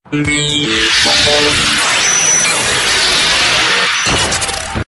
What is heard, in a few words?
beat